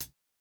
hihat, house

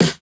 snare, snare house

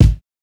kick, house

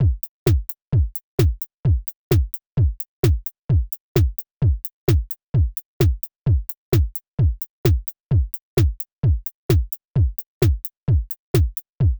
Korg loop 130; Electro; Micro korg

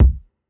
Jazz hop kick; Hiphop

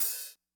open; pasific; Pop

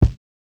kick, house

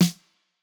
snare; Reference Beat BPM120; j city pop 1980

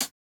hihat, house

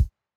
kick; Reference Beat BPM120; j city pop 1980

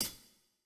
Rock hihat; Reference Beat

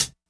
hihat; Pop; funkvol1

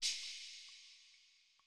ride, lofi hiphop; Legendary lofi hiphop

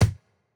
Jrock kick

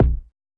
Hiphop kick; Reverb+EQ+Comp+Limit

